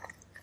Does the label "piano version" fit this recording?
No